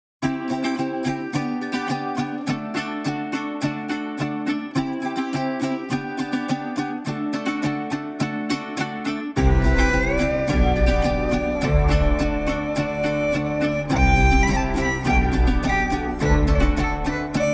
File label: Upbeat Guitar & Erhu